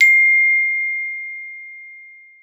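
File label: GLOP C4, glockenspiel; Xsample VOL-4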